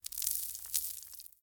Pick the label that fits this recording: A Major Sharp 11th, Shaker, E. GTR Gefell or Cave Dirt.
Cave Dirt